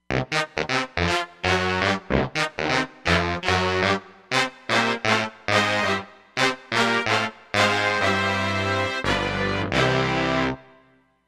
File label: Brass Sax